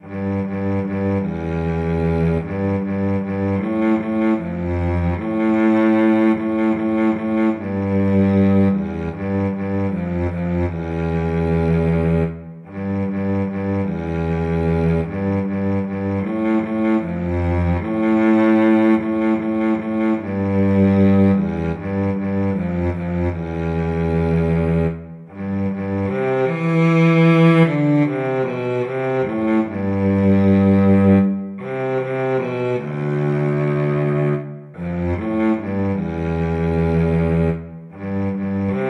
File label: cello; how great thou art